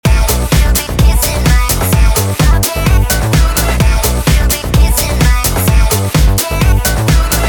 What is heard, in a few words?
stereo mix original